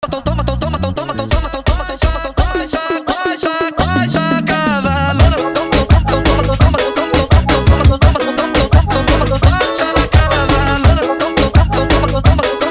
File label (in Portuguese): Arroxa Funk